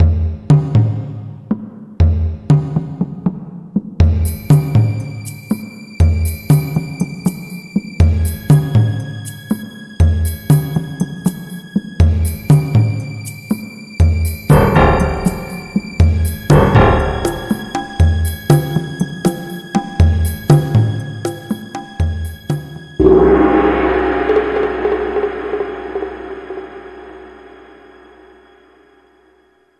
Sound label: Music; CS Rome